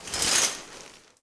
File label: Shower Curtain Open